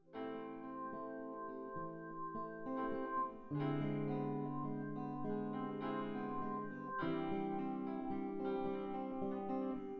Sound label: nodrums synthconv mix